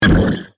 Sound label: Multimedia Internet CD-Rom Flash Buttons Short